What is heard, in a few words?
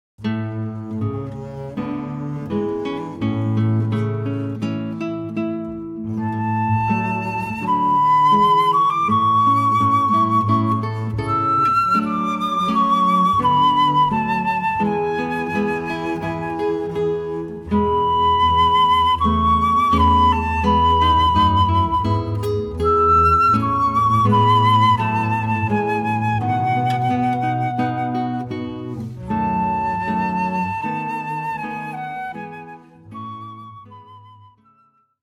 guitar flute bass trio; Where E're You Walk